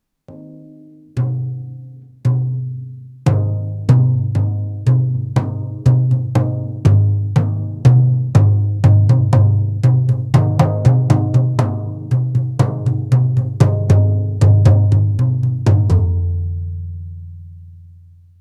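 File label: mediterranean style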